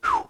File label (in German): wurfsound